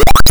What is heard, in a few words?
got bonus; Keen1